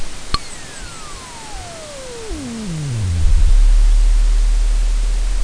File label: He4 Quantum Whistle